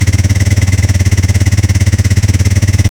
motorcycle engine; akira

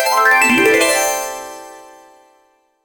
a magical sparkly reward, retro game

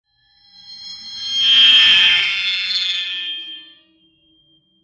epic legendary sword extr, stone grinding

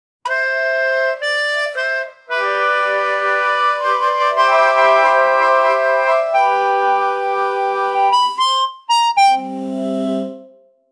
120 bpm upbeat country

